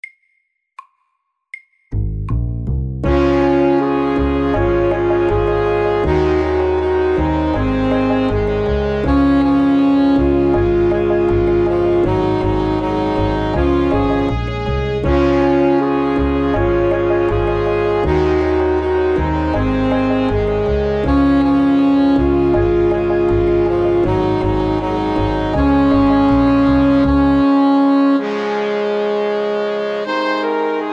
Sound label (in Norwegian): SAKTE; Banana boat song